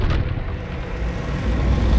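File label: Music Volcano 120; from dust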